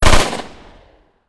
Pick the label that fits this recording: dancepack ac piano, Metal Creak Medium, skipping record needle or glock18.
glock18